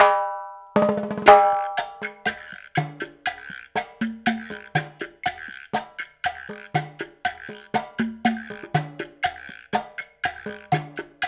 120 CHA, African Contemporary, Cha Cha Cha; Spectrasonics; Supreme Beats

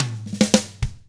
POP ROCK, Double Platinum Drums; 109POP ROCK

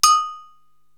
Agogo Bell, Korg TR-Rack

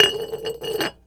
JAR ROLL, rhythmic hits; Rarefaction; Poke in The Ear With A Sharp Stick

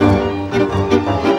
VIOLA GROOVE; Best Service; World Colours

